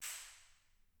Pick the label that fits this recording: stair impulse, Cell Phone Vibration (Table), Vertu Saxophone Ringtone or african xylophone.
stair impulse